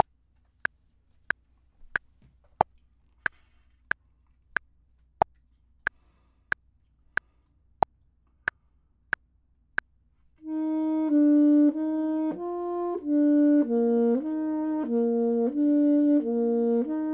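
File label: bottom, Saxophone and Clarinet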